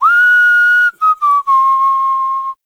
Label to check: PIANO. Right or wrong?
Wrong